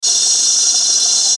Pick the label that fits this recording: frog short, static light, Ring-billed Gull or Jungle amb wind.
static light